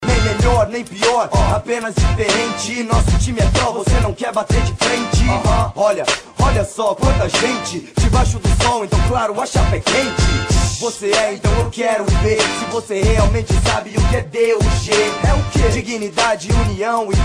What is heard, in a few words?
Rap; Palmeiras